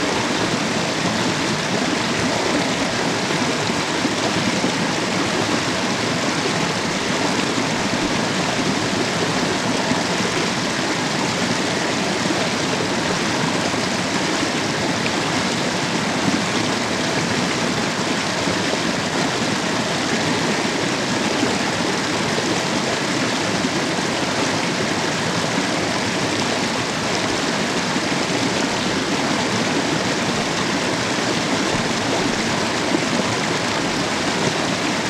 Flow Medium, Water

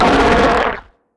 attack; Barotrauma; Hammerheadgold

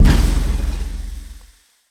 scrap Cannon Shot; WEAPON; Mechanic

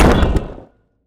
Damage alien Ruins Wall

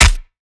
damage guardian pod impact; Alien

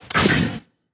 MUDRAPTOR attack

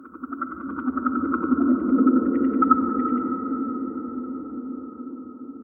Ambient

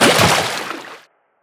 Splash, Water

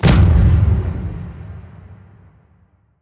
Coilgun, Weapons

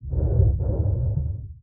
Drown Male

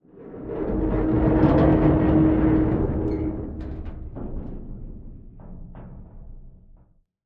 Creak; Damage

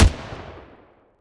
hand Cannon; WEAPONS; Captain

